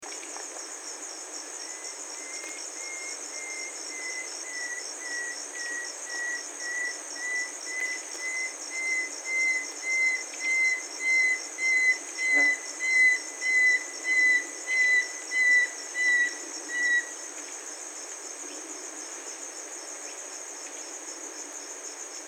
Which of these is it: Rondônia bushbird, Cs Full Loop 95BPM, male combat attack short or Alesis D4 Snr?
Rondônia bushbird